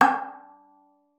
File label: FING G6, VIOLIN; Xsample VOL-5